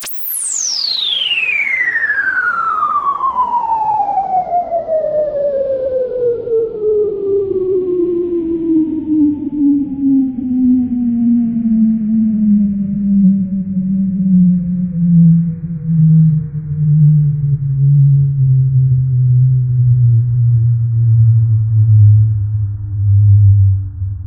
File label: Sweeps Down